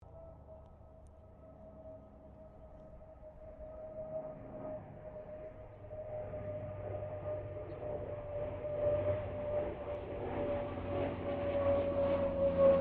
AIRCRAFT BOOMERANG SABRE PASS; Mixed aircraft squadrons; Airplanes; Vehicles

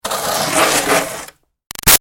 Snow Scrape Shovel By Gritty; Yard Tools; BigRoomSound

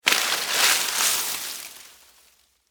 Foliage Drop Move, Palm, Frond

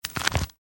Police Badge Open Flip Leather Wallet; Emergency; Flick Sound Effects

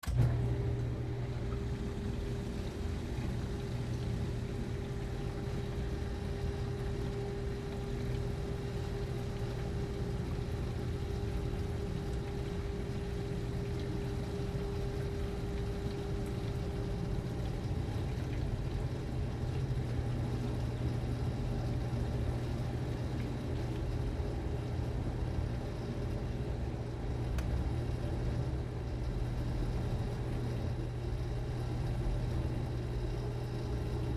Dishwasher On Off, Dishwasher Run On Run Off; Whirlpool Appliance; Household; Blastwave

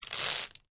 office tape parcel rip, Parcel Tape Rip From Roll; Parcel Tape Ripping From Roll; Offices; McKinney Sound

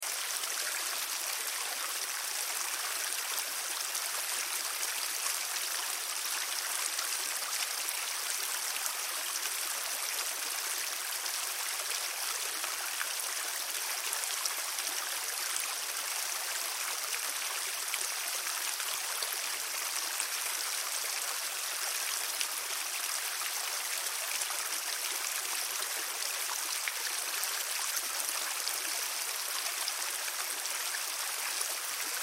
Stream Flow Loop; Rivers; Nature; Blastwave

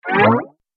Multimedia Rollover, mouseover sound; Andrew Fleming

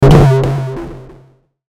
Hit Fuzz Delay; sound design; Blastwave

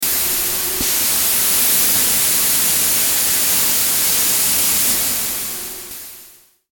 Static Pressure Bursts; Production Element Imaging Element Accent Transition; Blastwave; Static Sound Effects

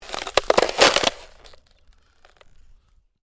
Ice: short crack of ice under pressure

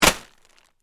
Impact Object Fall, On, Plastic, Tarp; Miscellaneous Impact Sound Effects

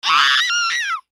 Human Scream; Scream Element Crack Male Seven Yrs Boy; Blastwave

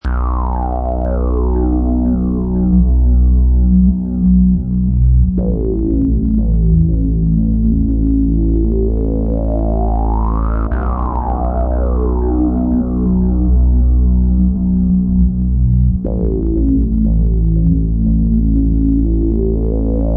Deep n Creepy; High quality stereo deep and creepy background sound excellent for evoking fright and fear, perfect for horror-thriller genre

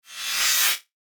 FLASH ELEMENT HIGH TECH

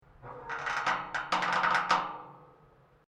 GATE METAL LARGE CREAK, Large metal gate

